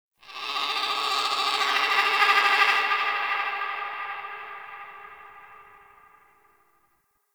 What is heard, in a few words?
Ext Creature Distant Mid; Warframe; Infested Eris